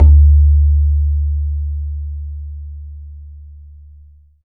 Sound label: BAYAN; Q Up Arts; Denny Jaeger Private Collection